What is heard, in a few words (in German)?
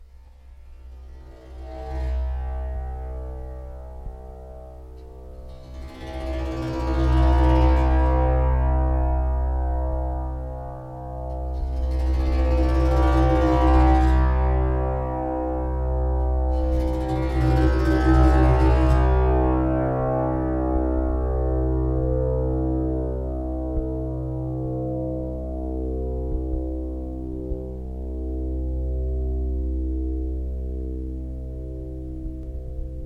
monochord basssaiten